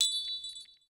Coin Toss; Reality Bytes

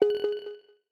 notifier low marble; Mozilla; Boot2Gecko

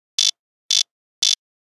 Nokia Buzzer Croak; Windows Phone apps

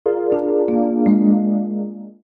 shutdown; China Mobile; cmcc4g

